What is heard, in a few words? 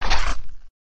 vampire bite